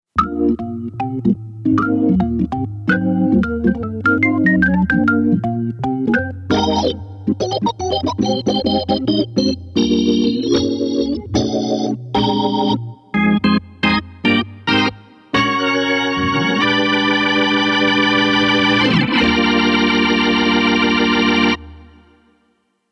virtual tone wheel

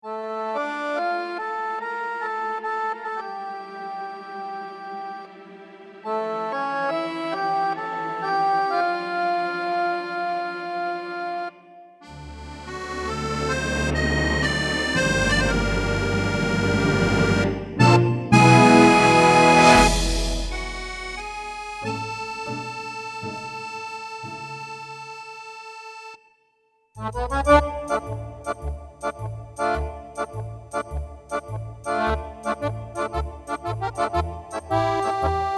accordions

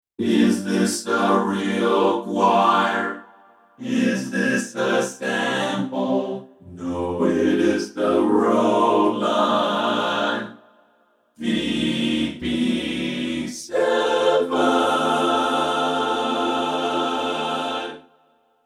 vp-7 classic

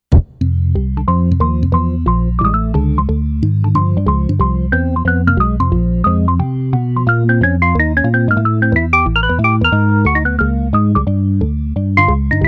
jazz organ

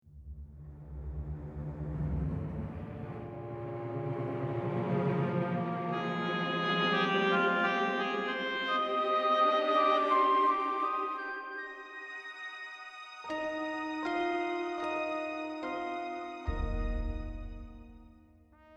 film score

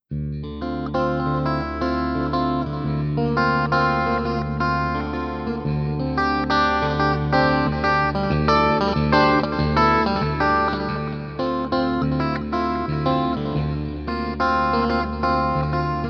multiband comp

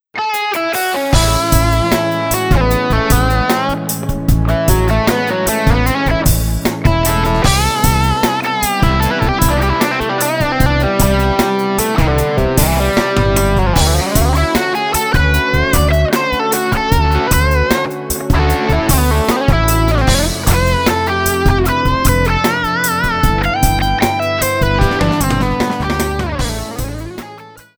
patch demo

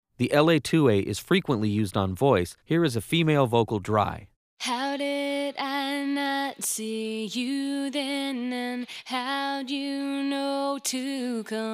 vs8f 3 la 2a vocals

